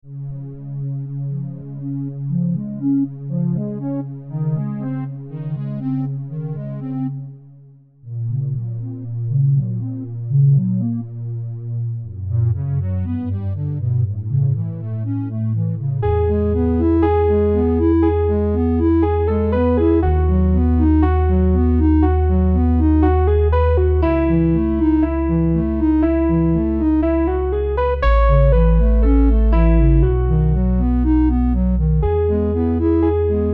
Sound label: Robot Love Lullabye